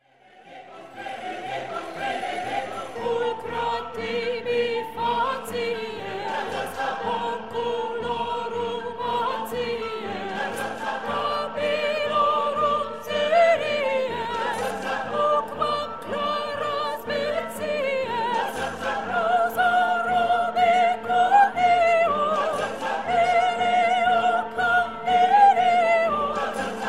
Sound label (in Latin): Veni veni venias Coro 1 Soprano slow